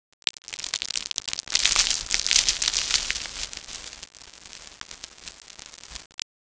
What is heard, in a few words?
falling grit; cstrike; rocky